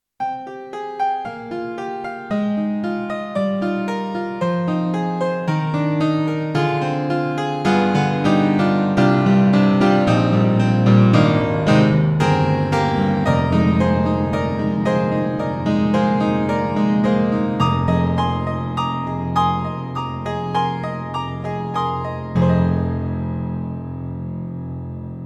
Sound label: Alesis NanoPiano, Acoustic Piano, Spinet